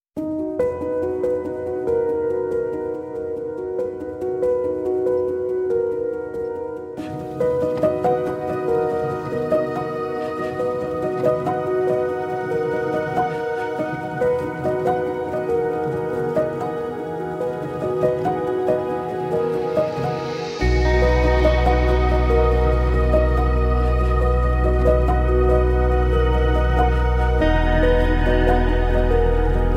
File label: study music